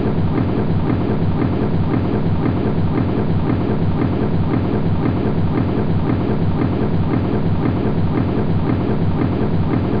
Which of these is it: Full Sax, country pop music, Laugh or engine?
engine